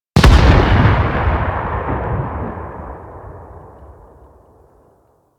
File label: Tower Mining Burst A; Warframe; Ambience; Eidolon Plains; Ostron Village